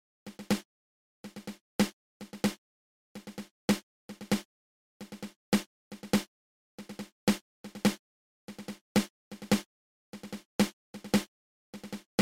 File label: Double Drag Tap open close open